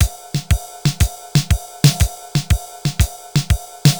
TR 505 (120BPM), beats; musicradar; cyborg beats

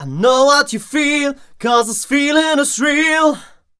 m 128 e-flat, Vocals

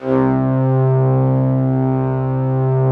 ST ORG, STRINGORGAN, Yamaha VP-1; Music Store; 90's Sample CDs

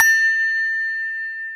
BELL A, TOY HANDBELL; AKAI S-Series CD-ROM Sound Library VOL-7

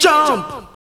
SNG JUMP; AMG - Now CD-ROM (Roland)

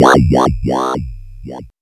Arp 2600, synths

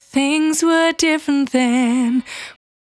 Cry 68bpm B, Vocal; Zero-G

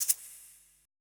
E SHAKER, ETH PERC

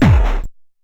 Kick Lo, Stylophone Beatbox

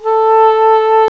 OBOE, REEDS; CMI Sound Library Rev 1.3

